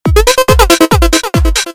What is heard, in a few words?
Loop